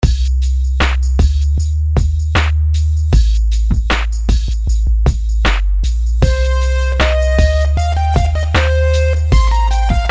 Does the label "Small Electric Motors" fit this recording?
No